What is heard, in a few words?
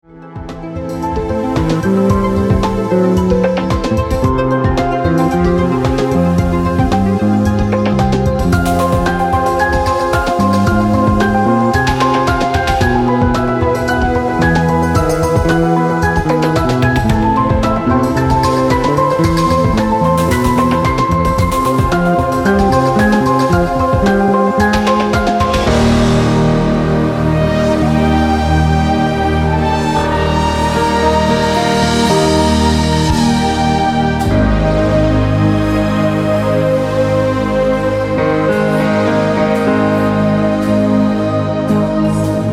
atmos